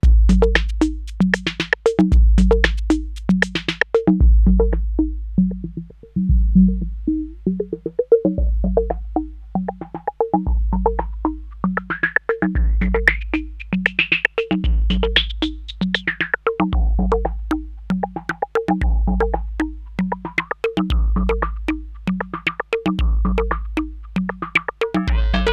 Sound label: Filter Modulated Drums; Behringer 2600